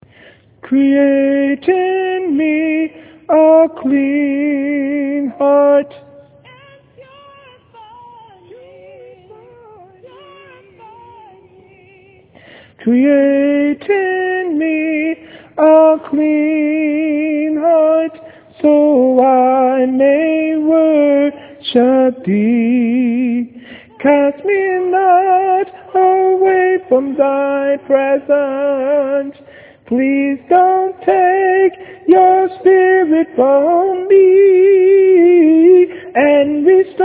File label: Tenor